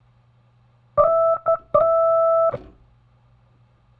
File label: Organ, 70s Analog Funk; Sonic Foundry (Sony Creative Software); What it Is! 70s Analog Funk